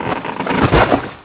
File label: tree falling; Bounce Touch